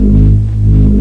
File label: Energy Loop